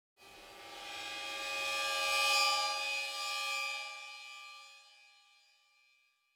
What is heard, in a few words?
Suspense Transition, Horror Sci-Fi Music; Dead Ark